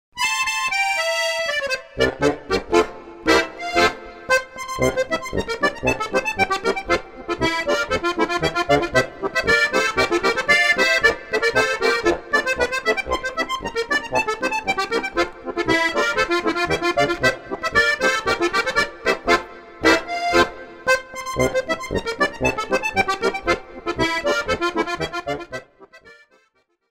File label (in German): polka, Harmonika